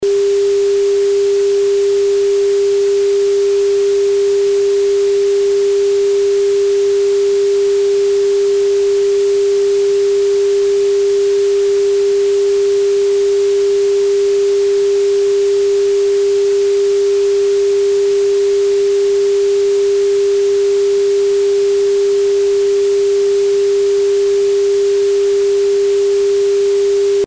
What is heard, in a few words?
Noise Tone Control